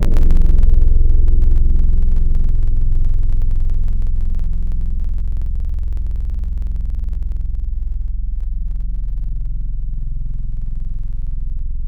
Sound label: Keys Simiple Piano; Synthetic's Serum Collection; ESW X Keys